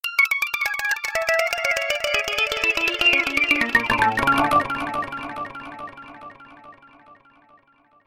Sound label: arpeggios arpeggio